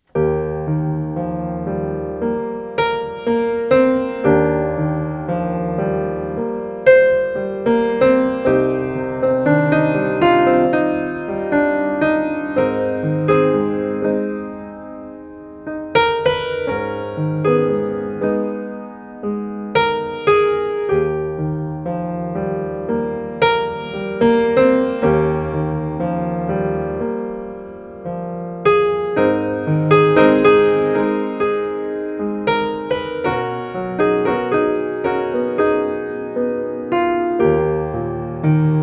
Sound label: piano